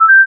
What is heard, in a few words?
Message Outgoing